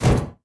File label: ladder, player; quakemapping; mod slipgate